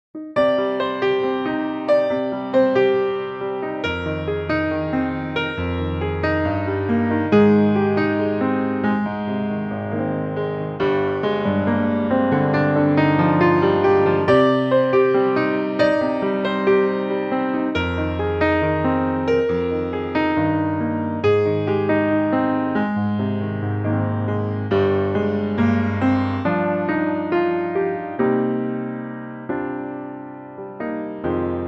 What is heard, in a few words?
Cm piano; key - Cm - vocal range - C to G (optional C falsetto); Superb piano only arrangement